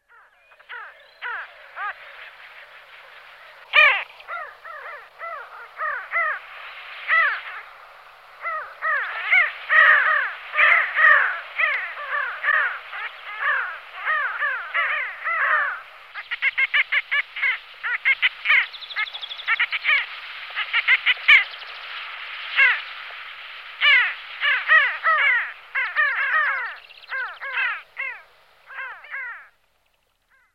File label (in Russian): p. alchata, golosa pterocletiformes